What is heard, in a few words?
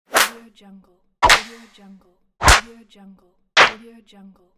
slaps in the face